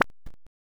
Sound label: mining